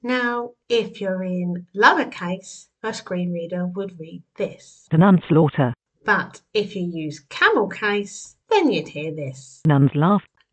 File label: Nuns Laughter